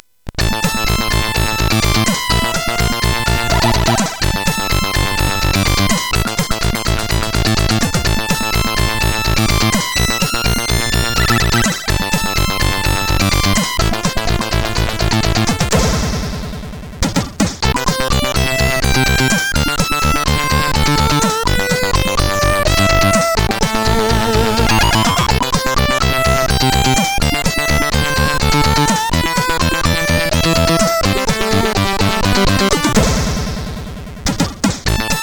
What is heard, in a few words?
Test, CSG8580R5; hvsc